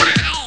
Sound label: scream; ST-XX Amiga Soundtracker Sample Packs